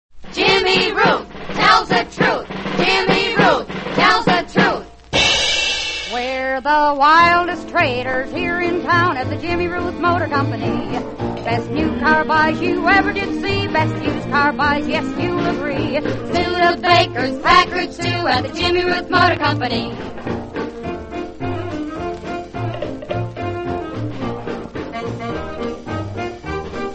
radio jingles; jimmy ruth motors; allied radio artists

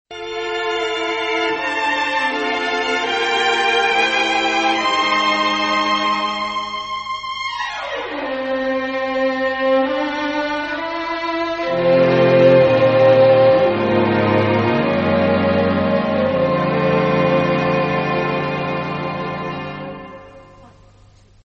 slow instrumental; australian otr promos and idents

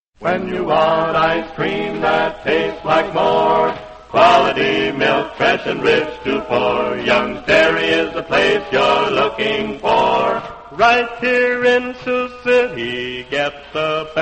radio jingles; youngs dairy; allied radio artists

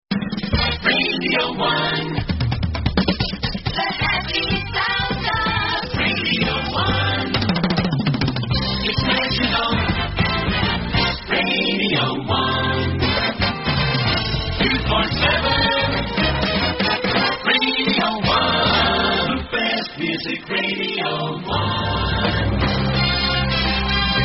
radio 1 jingles the happy sound of radio 1

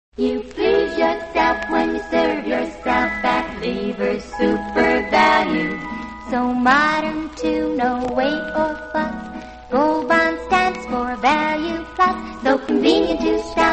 radio jingles; leevers super value; allied radio artists

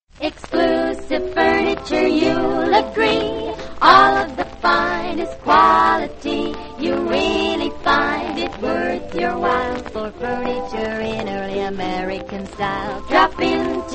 radio jingles; exclusive furniture; allied radio artists

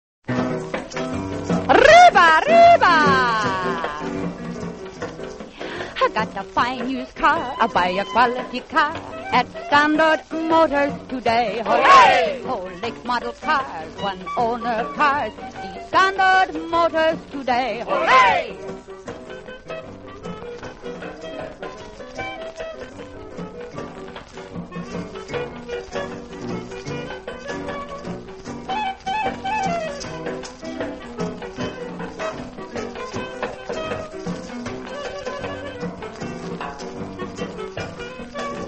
radio jingles; standard motors; allied radio artists

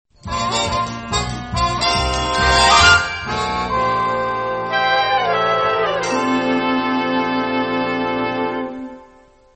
short instrumental intro; australian otr promos and idents

